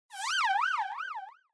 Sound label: moods hype